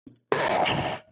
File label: stun